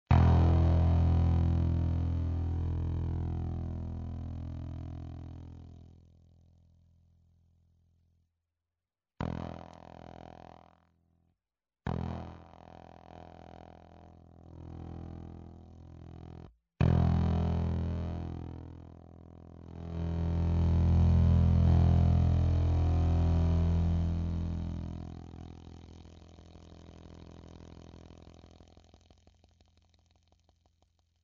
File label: BB DISTORTION, beta bass output distortion